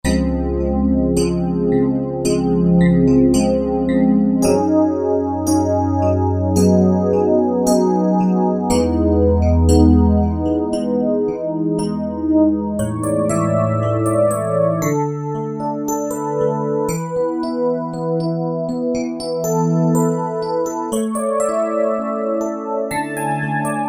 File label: MK-80 Rhodes; Roland JV-2080